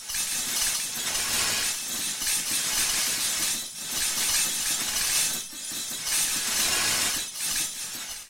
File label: Glass